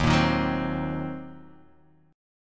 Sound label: D sharp Minor 6th D sharp down; strummed; JGuitar